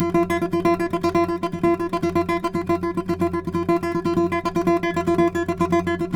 NYL TR, FLAMENCO FX; Spectrasonics; Hans Zimmer Guitars VOL-2

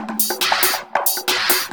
percussion loops, 138, Techno

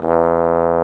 BRS BSBONE, Bs.Bone Solo; Roland LCDP06 Brass Sections; Bs.Trombones

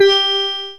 STEEL DRUMS

